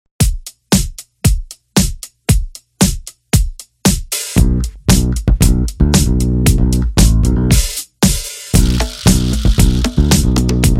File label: Funky Corporate